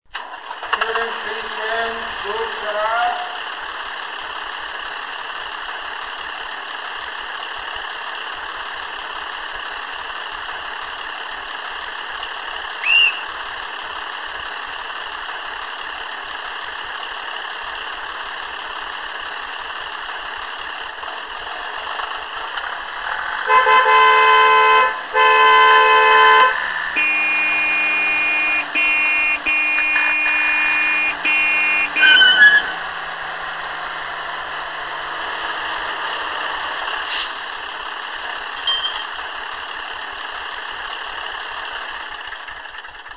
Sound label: Draisine; VT BM35; OEBB